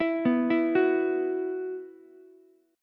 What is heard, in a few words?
B Suspended 4th, Ukulele; arpeggiated; JGuitar